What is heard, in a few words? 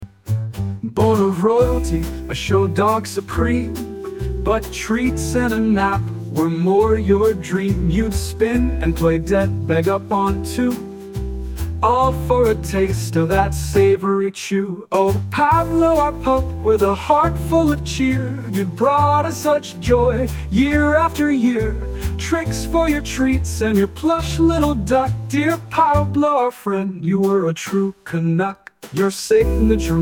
Jazzy